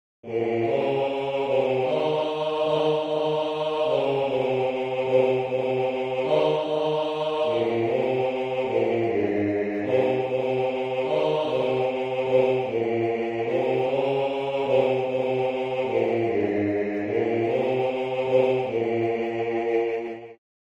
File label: hymn; Ambrosius